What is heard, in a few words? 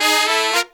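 Pop & Funk Brass, 110 Bb, right; Best Service ProSamples vol.25; AKAI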